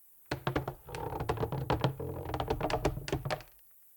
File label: Exterior Wood Creak; The Town; 3D Environment